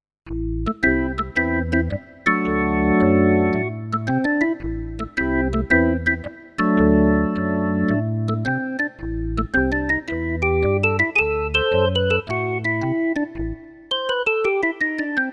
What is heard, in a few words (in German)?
CP40 Orgel